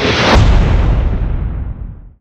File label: reverse polarity cast; weapons; dota; magnataur